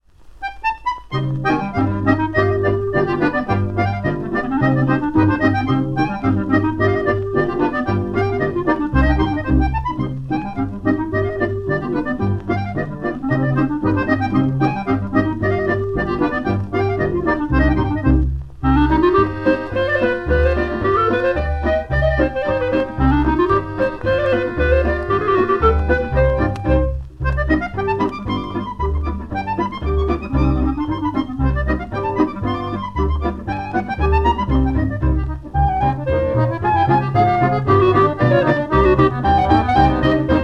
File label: polka; Finskaya